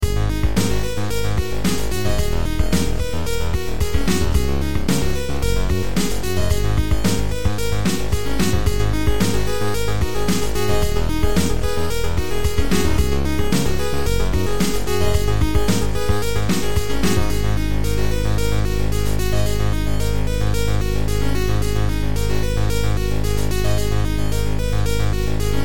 keygen music

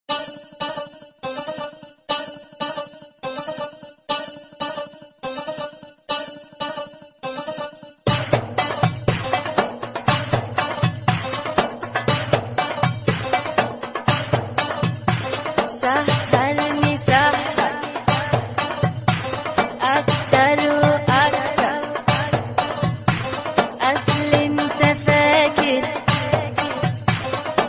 arabic ringtone

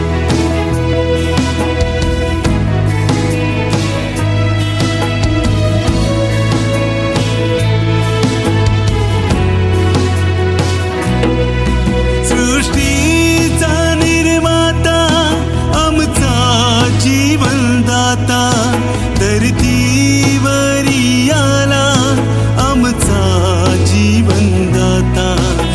marathi romantic song ringtone

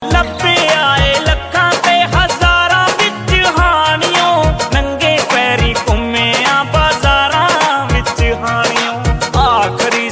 sweet punjabi ringtone

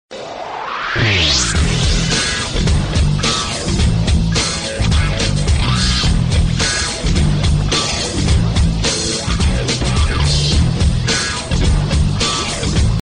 nokia stream ringtone

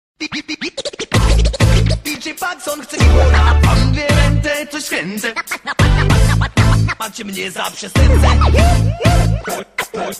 riddim ringtone

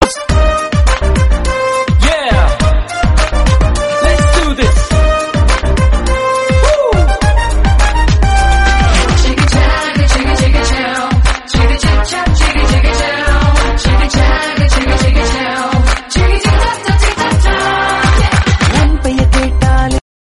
hip hop tone ringtone

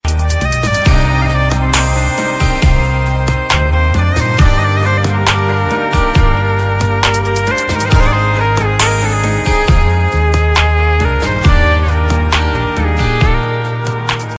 sad music ringtone

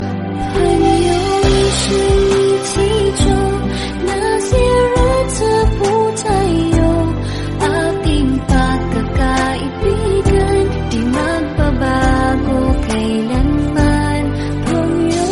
romantic english song tone ringtone

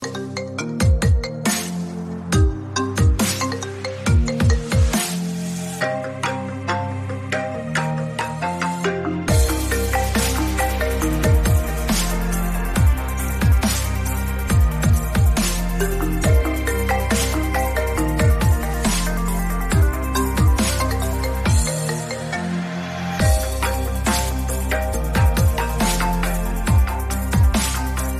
apple iphone 8 ringtone

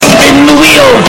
spin the wheel loud ringtone

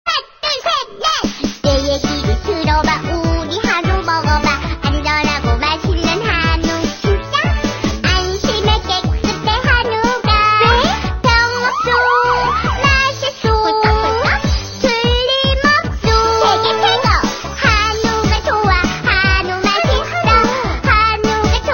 korean song ringtone

